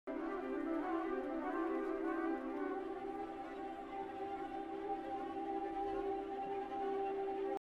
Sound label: Clocks And Clouds; Ligeti